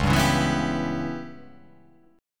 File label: C sharp Minor 7th Flat 5th C sharp